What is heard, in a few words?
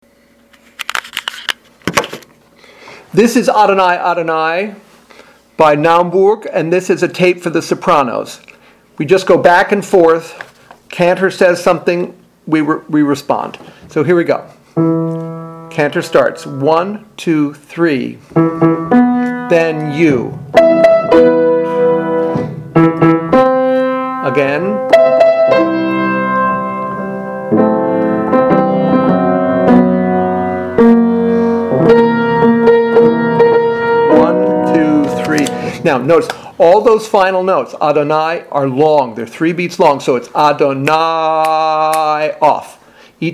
Adonai Adonai soprano; Naumbourg